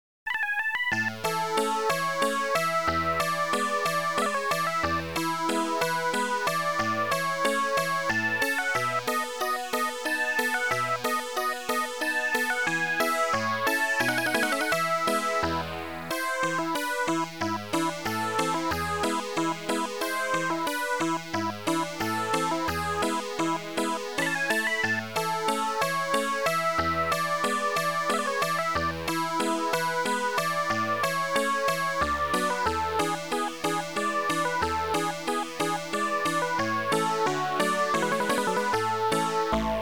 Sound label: minuet; Educational Software Cooperative 4